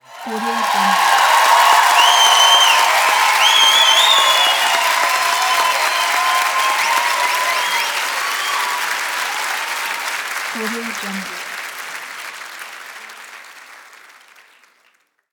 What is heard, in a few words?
concert whistling applause